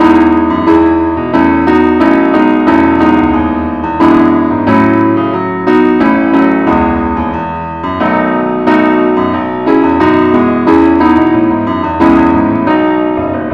ineedthee keyboard only key e

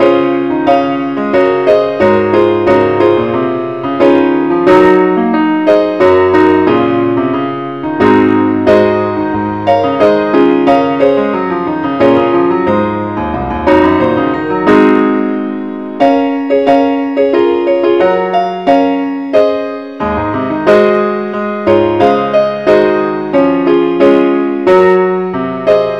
ineedthee keyboard only key cis

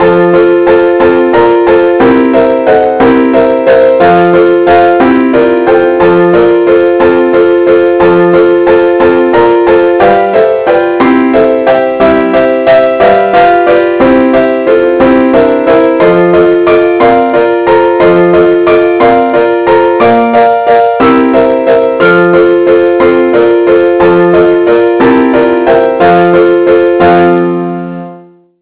compare Chords

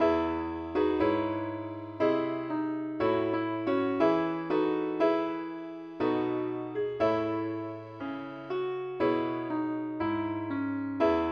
ukelele key e

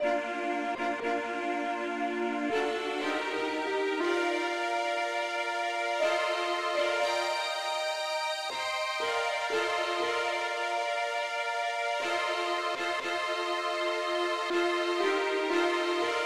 uke lead

